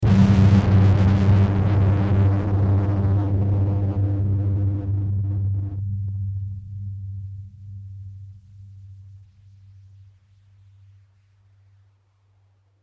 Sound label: bomb